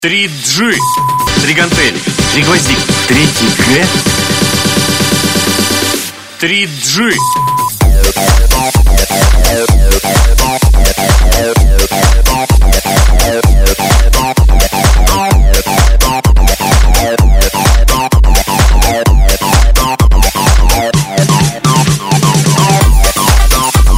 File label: scratchin